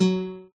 guitar, note; Minecraft; block

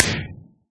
fireworks blast; Minecraft

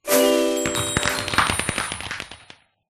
celebration; Minecraft

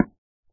wood, dig; Minecraft

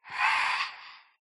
idle, phantom; mob; Minecraft

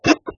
ambient, witch; Minecraft

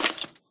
step, skeleton; mob; Minecraft

